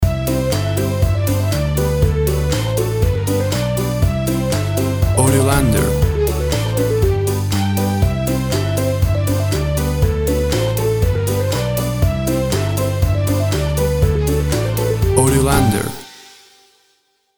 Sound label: Upbeat Kids Party Music